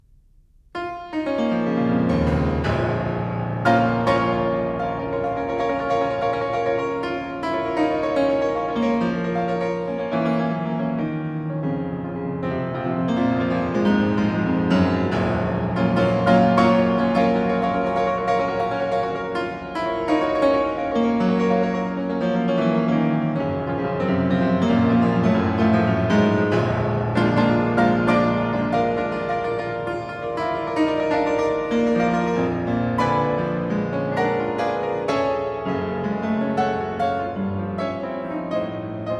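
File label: Piano Sonata No. 2 in B flat minor; 24 Preludes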